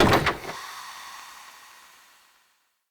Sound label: Rat Cage Open; Encounters; Animal Rescue